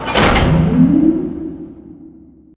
Robot Arm Track Start Mid; Boomtown; Titanfall 2

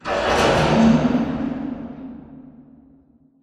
Robot Arm Track Start Dist; Boomtown; Titanfall 2

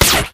imp bullet energy titan; Titanfall 2

